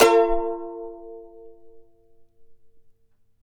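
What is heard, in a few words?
charango B minor down; Spectrasonics; Hans Zimmer Guitars VOL-2; CHARANGO CHD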